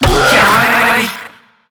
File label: Infested Lich Pain E; Zeke